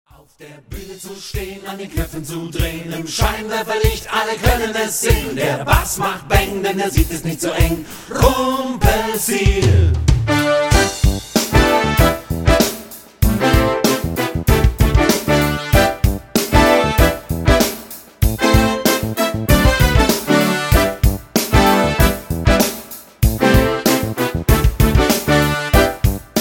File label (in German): bass baeng